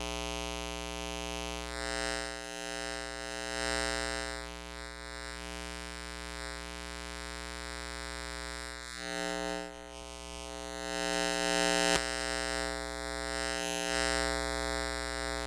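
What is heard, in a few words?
ambient hum buzz loop, electric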